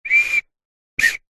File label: whistle blow weak broken mostly breath noise; foley